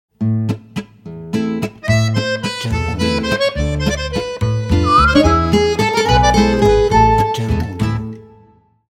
French Logo Ident

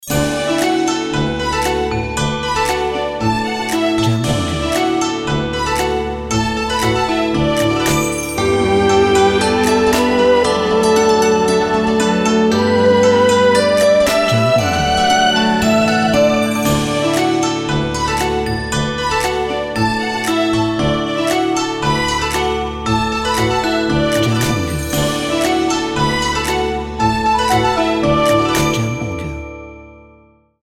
Dragon Dance Chinese

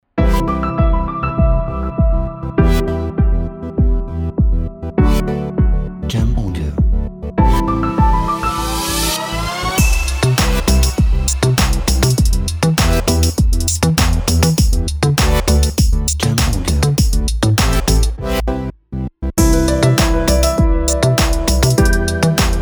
Inspiring EDM Hi-tech

